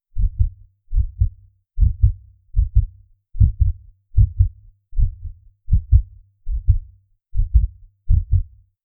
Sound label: short deep magical pulse; mystical